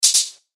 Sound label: Chime Percussion; Multimedia Web Interactive; Hits (Sound Effects:Musical)